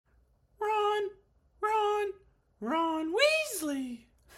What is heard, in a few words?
metronome